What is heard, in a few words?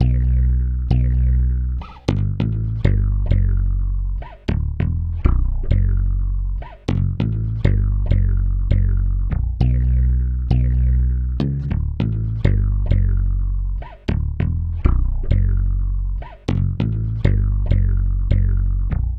Stick Bass; Sonic Foundry (Sony Creative Software); Club Joints and Street Anthems